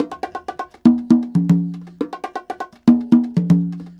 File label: con, Percussion; Big Fish Audio - DOM